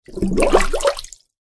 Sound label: Bubbles Rising To Surface Of Water; Bubbles Rising And Breaking Surface Of Water; Nature; McKinney Sound